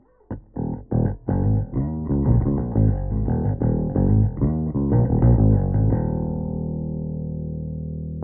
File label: bass; Valves; Low Strength